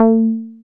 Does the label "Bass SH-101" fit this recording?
Yes